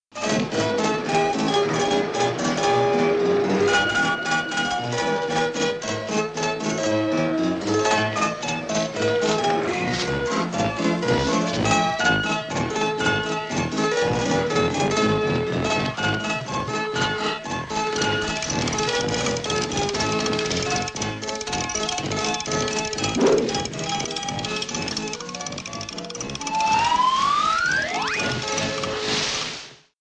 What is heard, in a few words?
piano melody